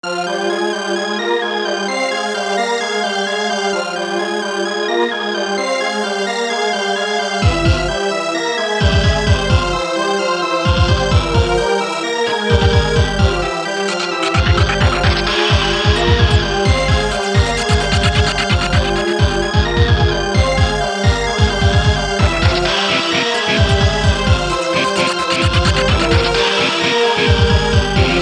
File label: Electric Distortion